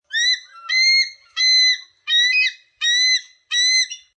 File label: American Bald Eagle Squawks Several Times, Bird of prey; American bald eagle sound, squawks several times.; Bird Sound Effects